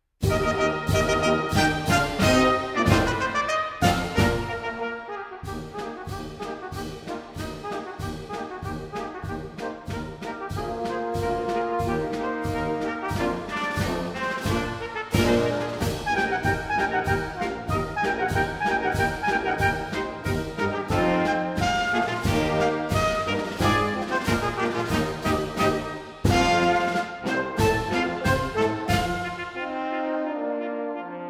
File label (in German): Bläserquintett Radetzky Marsch; 15 Länder